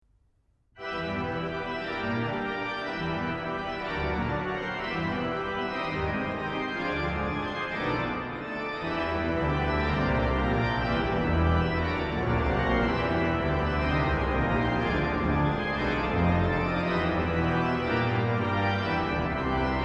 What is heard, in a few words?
Sonata in e pro organ